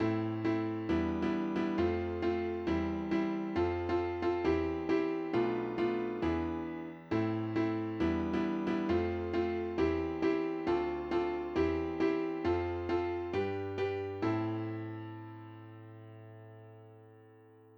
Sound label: piano chord